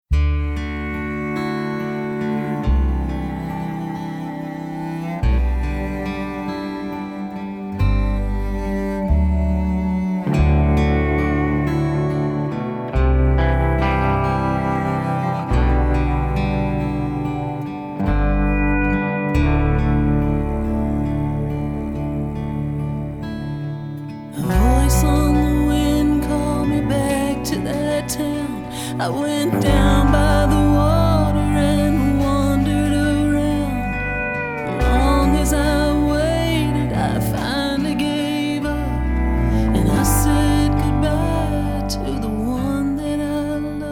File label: Instrumental